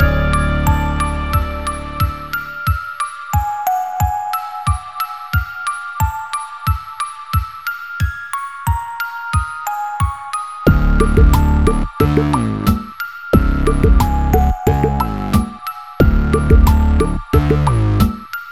battle; Instance rainmaker

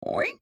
pig exclaim

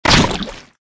throw wedding cake

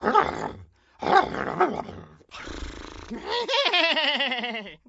horse long howl

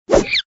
pie throw only

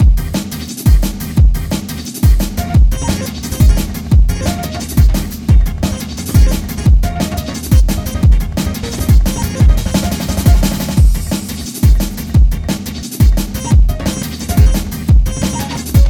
interior

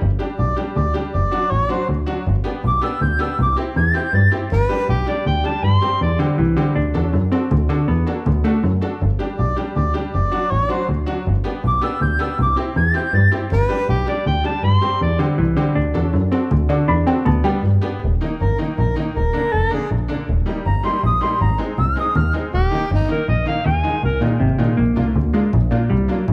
gag shop

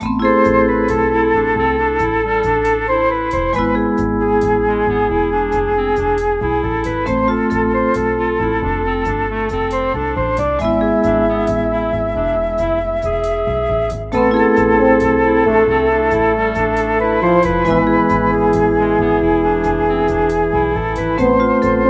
hard boss intro

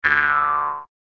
step on rake

